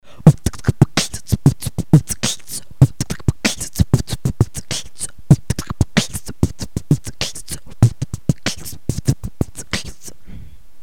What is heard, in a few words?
beatbox